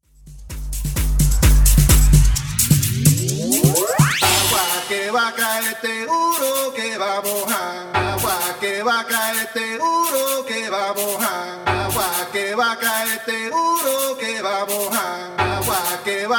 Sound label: Latino Agua Tribal 129bpm; Bootleg